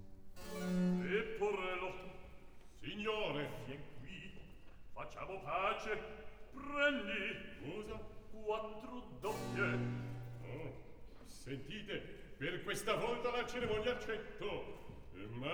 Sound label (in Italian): Recitativo; Leporello!; 2. Akt; Mozart; Operas